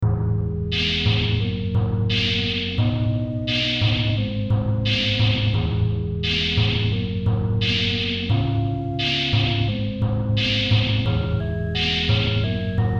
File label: Sine Wave